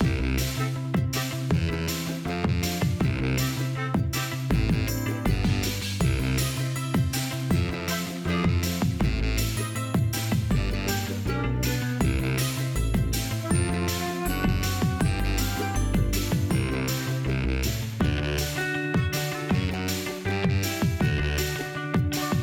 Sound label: Music Main Menu; thomas friends go go thomas; android